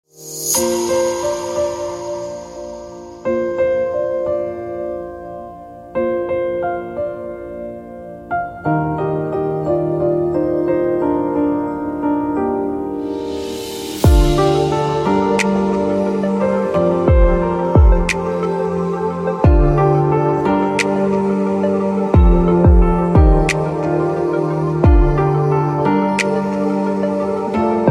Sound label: Piano Instrumental Ringtone